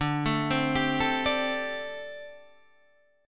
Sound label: G Suspended 2nd Suspended 4th D